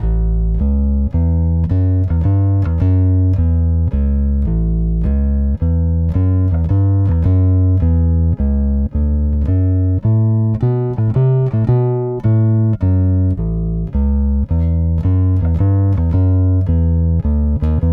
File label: Blues Bass; Digidesign; Pro Tools Essential Loops